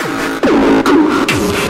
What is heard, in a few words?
Bassdrums Hard Kicks; Vengeance Samples